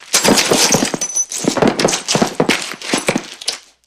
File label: Body Fall Glass Debri